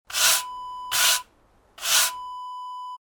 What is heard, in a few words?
broom sweep three